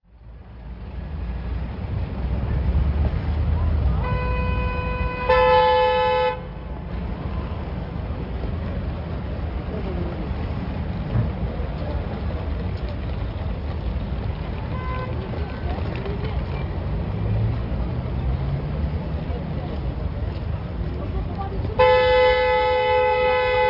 city sounds

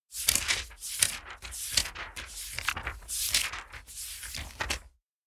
journal pages flipping

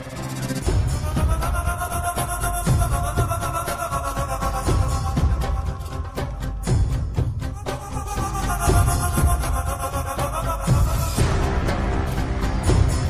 holiday bgm

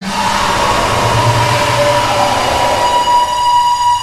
jumpscare sound; the last night at fredbears

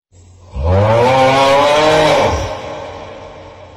aughhghhghg

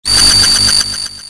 jumpscare sound

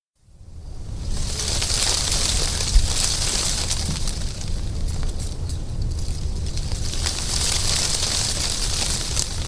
soft rustling of banana leaves

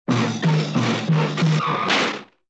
pounding on a door which opens

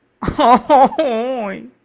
haha uy

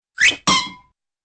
high pitched slip and fall

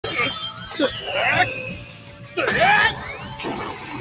sword skill; sao

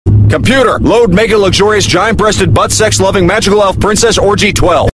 computer load

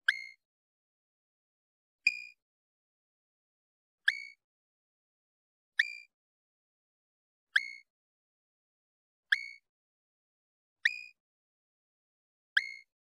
weapon switch

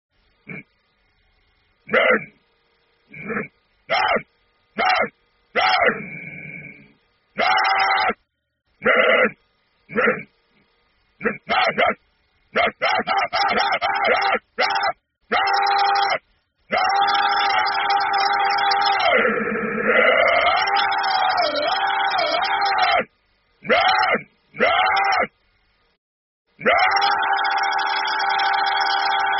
rahh

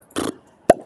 lip pop

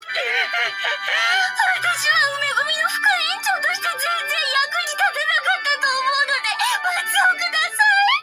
crying; opal

